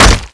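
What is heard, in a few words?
impact stone low thud; Explosions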